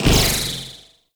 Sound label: Ricochet, Shotgun; Crp BE Arca Plasmor